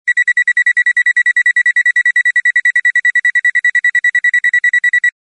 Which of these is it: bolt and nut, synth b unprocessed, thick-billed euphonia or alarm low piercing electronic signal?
alarm low piercing electronic signal